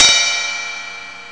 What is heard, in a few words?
hihat; Amiga Soundtracker Sample Packs